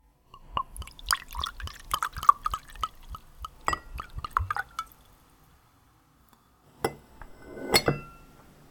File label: Pouring The Vodka